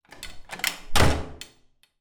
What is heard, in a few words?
door close wooden bathroom hard key in door swing; household; zapsplat